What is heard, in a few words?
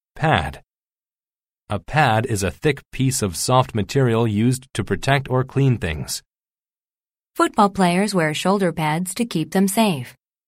pad